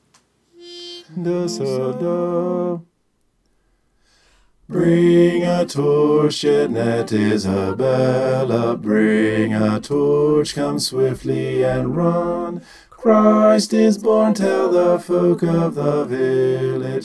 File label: bass